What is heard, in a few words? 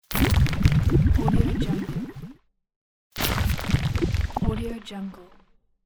oil sound; فرو رفتن چیزی در آب; game sounds